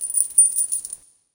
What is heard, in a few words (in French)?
chaines; thereisnogame